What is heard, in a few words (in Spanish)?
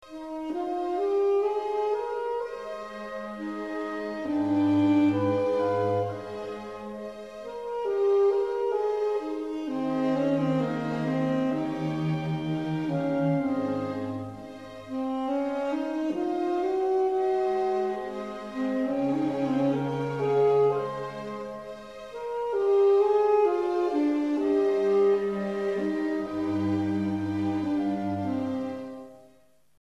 saxo, Saxofon